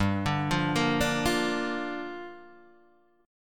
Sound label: G Minor 7th, Guitar, DADGAD